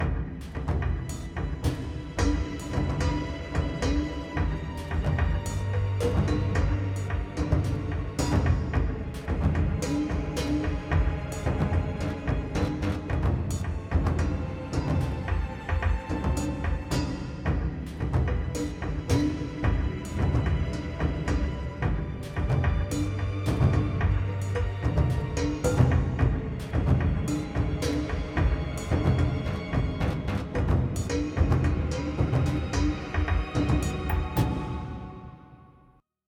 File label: Creep Medium